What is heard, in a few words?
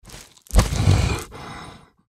deathclaw idle crouch up; npc